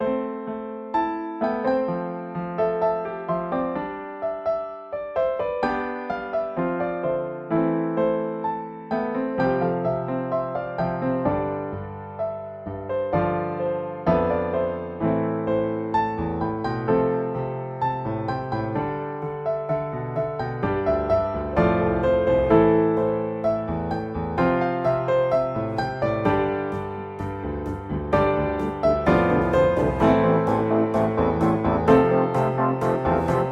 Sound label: Progressive House-ish